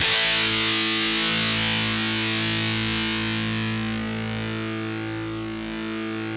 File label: shredder, bizarre guitar; Spectrasonics; SHREDDIES